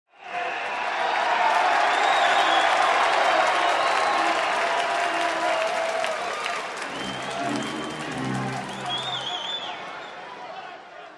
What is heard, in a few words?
Concert Crowd Applauding